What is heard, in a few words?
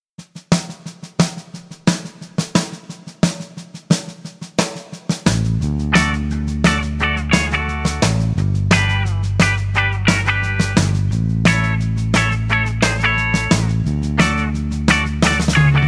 D No Backing Vocals, backing tracks